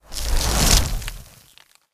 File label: Frost Fade In